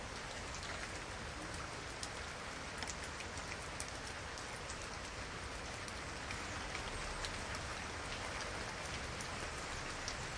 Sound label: gentle rain; Sound FX Real Life